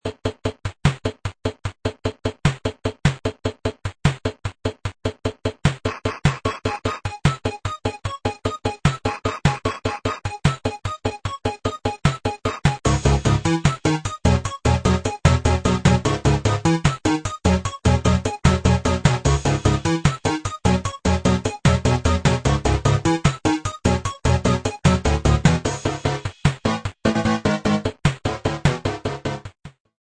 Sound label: intro 8bit